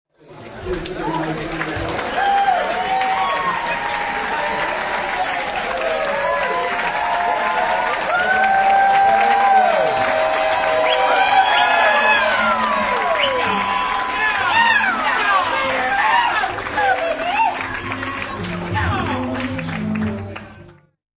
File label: Exited cheering and clapping of wedding guests outside after ceremony.; Wedding Applause